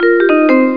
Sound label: Invitation; FirstClass; Mail Halle